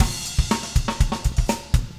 funk 120 bpm, loops

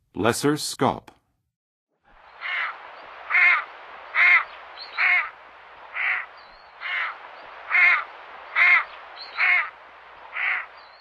Lesser Scaup; North America; Western USA; Western USA Songbirds

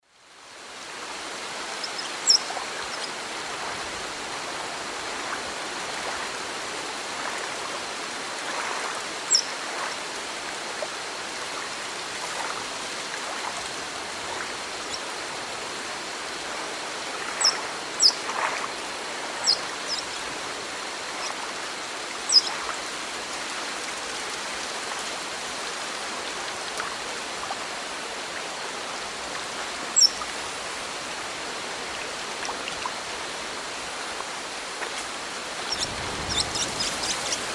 Chloroceryle americana, call and song; Eastern Lowlands

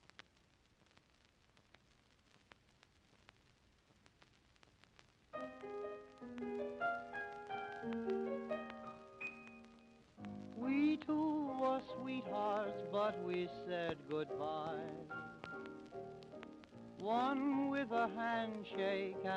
Orchestra vocal; Clarion; Popular Jazz Archive 1920-1936

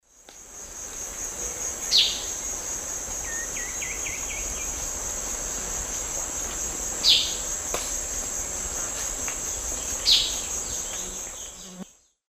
Myrmoborus lugubris, chip call; S. America; Eastern Lowlands

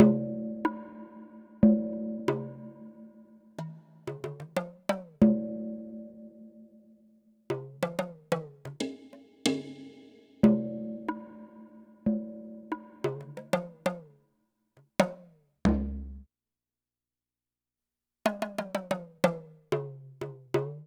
Minimal Planet, Minimal Planet Drums; Ambient Cinematic

